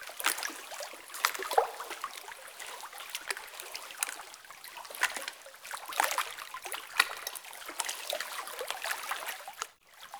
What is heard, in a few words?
Cave Ocean Water Lap Loop; Ambience; Midrath Island